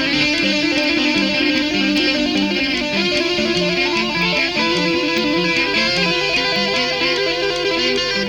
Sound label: guit